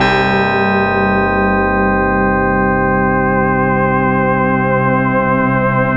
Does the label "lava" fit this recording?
No